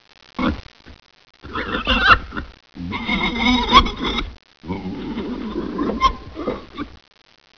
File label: pigs